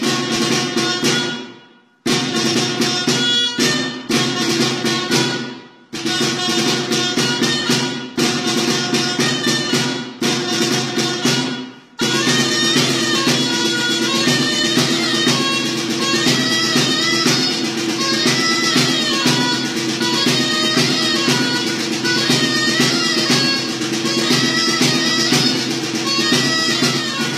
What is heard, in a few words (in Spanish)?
Musica de las guerrillas